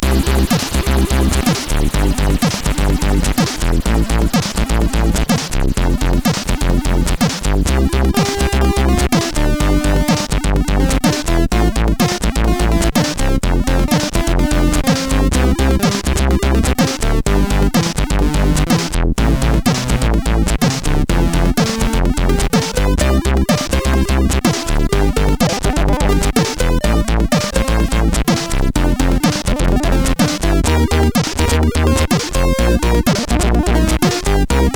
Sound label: Microwave, CSG8580R5; hvsc